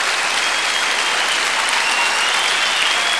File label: applause; AKAI S-Series CD-ROM Sound Library VOL-6